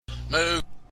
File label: say, cow; vfnGaming ResourcePack; minecraft; mob